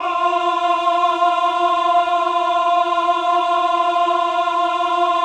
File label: VIB F4, VIBRATO, Voices; Spectrasonics